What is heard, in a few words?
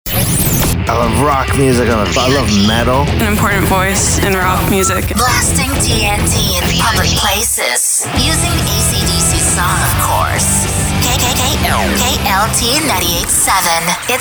ROCK Radio